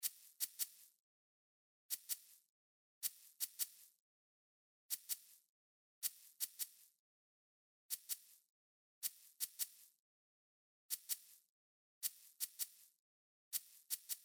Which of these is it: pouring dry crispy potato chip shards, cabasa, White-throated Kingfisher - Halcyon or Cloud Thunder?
cabasa